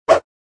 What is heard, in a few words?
Whoosh; Cars; tractor tip